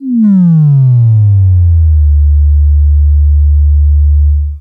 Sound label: SUB BASS; Greytsounds VOL-1